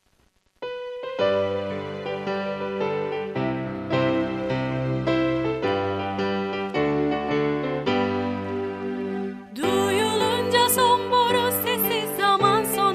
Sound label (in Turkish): son boru sesi